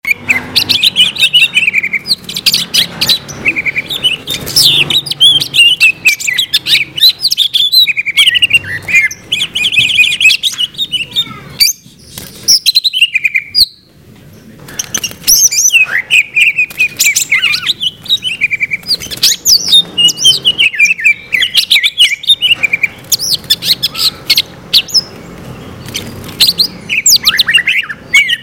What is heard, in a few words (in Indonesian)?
simulasi lomba cucak hijau duet